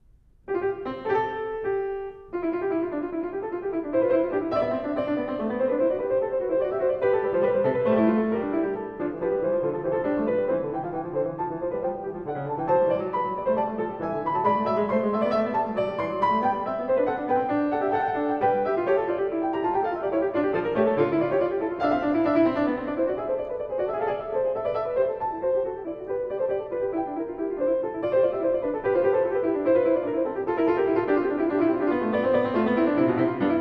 Prelude And Fugue No1 In C Major BWV 870 Fuga, Clavier; Johann Sebastian Bach; Well-Tempered Clavier